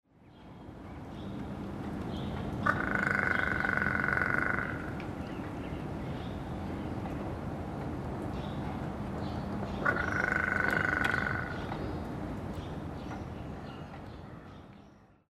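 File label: Large-billed Crow; sapporo; koe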